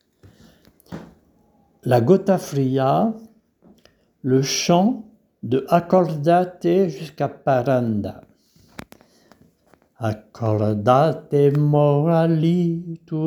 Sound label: Intro Chant